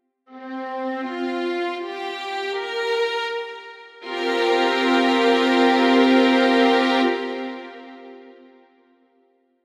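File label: chord strings sus4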